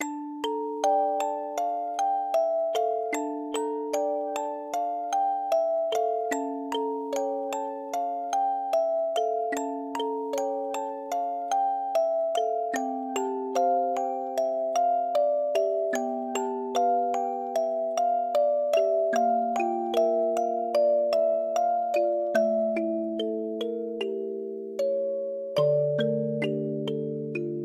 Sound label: Music box